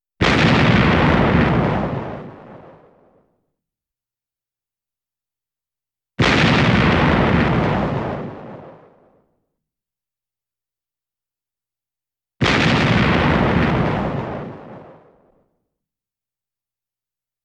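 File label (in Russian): из пушки стреляют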